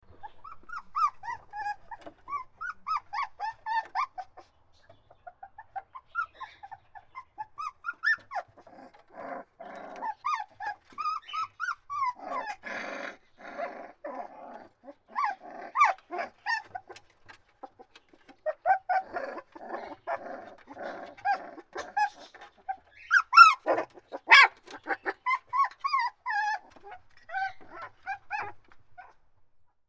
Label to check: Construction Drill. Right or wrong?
Wrong